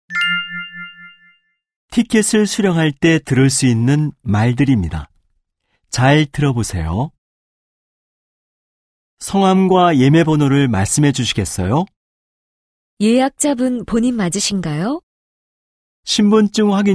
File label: K-POP